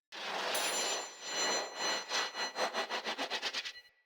Throwing Knives Orbital Projectile Start

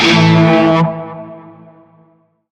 Shawzin Chromatic Chord J; Music; Warframe; Narmer

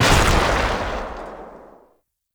Shot, Rifle; Warframe; Weapons; Thanotech; Trumna Prime

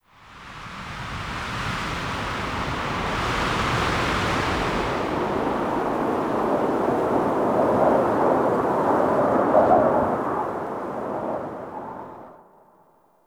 Exterior Wind Gust; Ambience; Warframe; Duviri

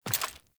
Steps

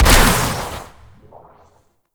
Amplifier Barrel Fire; Weapons; Operators Amp Barrel Part A Fire B; Warframe; Amplifiers

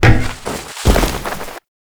APC Dismount Foley; Warframe; Lasrian

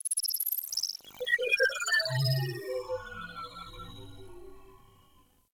Beep Short, Ambience, Interior; Warframe; Orokin

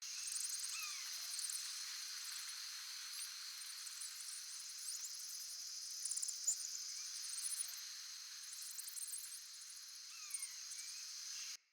Critter Loop, Ambience, Glades

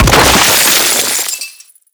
Sentient Column Destruction; Gameplay; Warframe; Tau; Destructible Dynamic

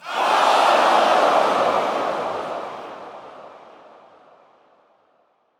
Point Missed Crowd, Lunaro Crowd

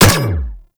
Energy Rifle Shot; Weapons

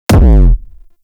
Briefcase Launcher Punch, Weapons; Warframe; Corpus

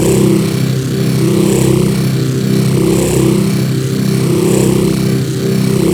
Core Reactor Loop; Warframe; Core Rooms

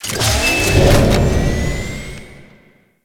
Ship Metal Mech; Warframe; Corpus Ship Remaster

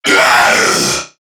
Death Crewmen; Warframe; CorpusCrewmen; CrewmanA

